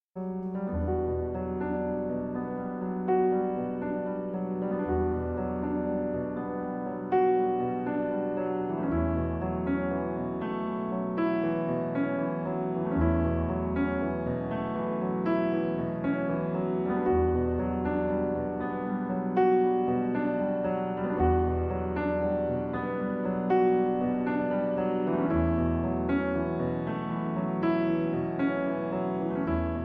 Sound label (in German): Schlaflied